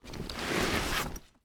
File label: Sports Bag Duffle Bag Slide Cement